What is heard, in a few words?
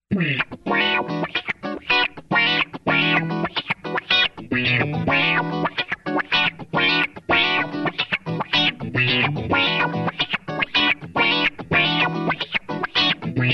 clean